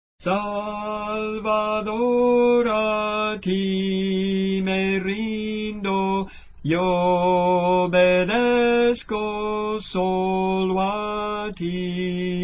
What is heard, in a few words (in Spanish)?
Tenor, Cantos Espirituales